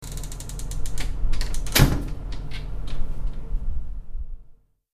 tuerschliessen

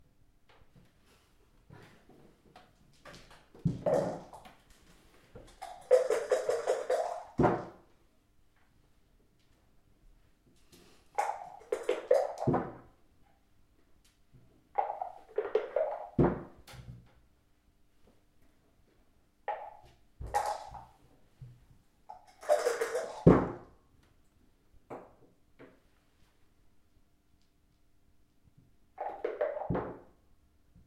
Kniffel

